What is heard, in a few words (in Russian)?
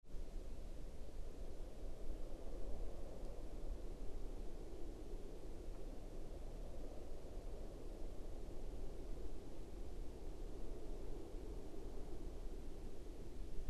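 звук зимнего леса тихий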